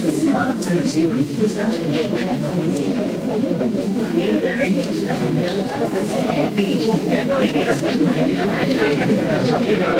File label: crowd murmur